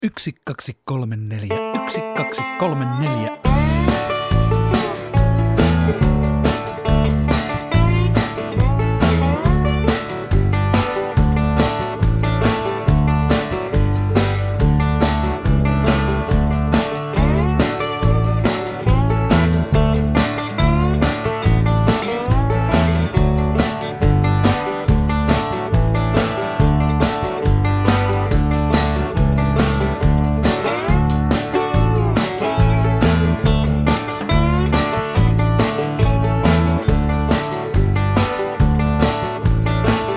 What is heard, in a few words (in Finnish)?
ilman laulua; alkulasku; paikallissijat